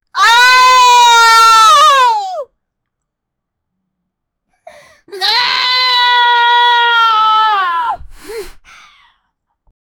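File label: a girl screaming and saying, oh no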